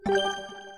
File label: Menu Validate L